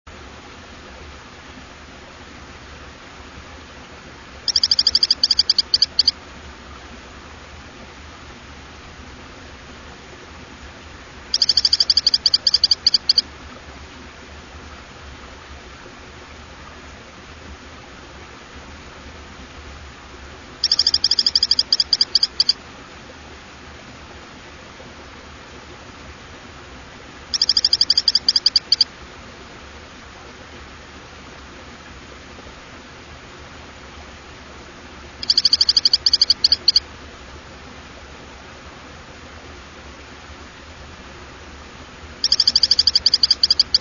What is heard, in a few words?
Hylodes phyllodes